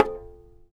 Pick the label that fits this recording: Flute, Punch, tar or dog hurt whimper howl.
tar